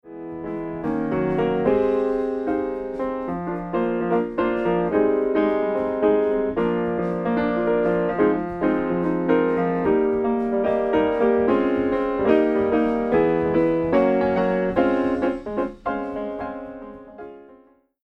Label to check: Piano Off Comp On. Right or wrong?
Right